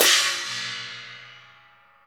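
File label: CHINA, cymbal; Spectrasonics; Heart of Asia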